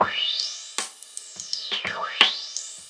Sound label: love filter, Drum loops